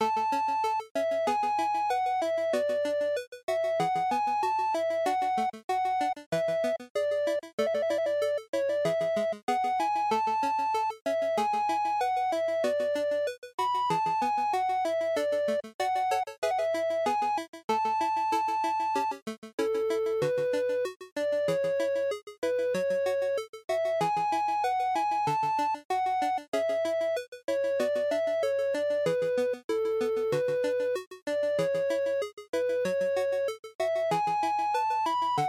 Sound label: NES RPG Opening Loop95